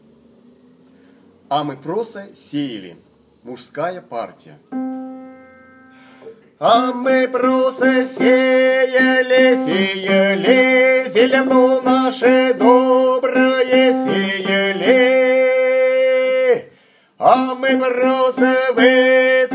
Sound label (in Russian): А Мы Просо Сеяли Мужская